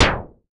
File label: Moog SD; Moog Modular55